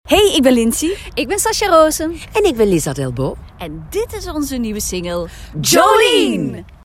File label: Jingle